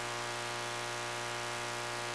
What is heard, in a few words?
sound, Boat; SeaSnake